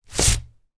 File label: vinyl disc slide into sleeve, household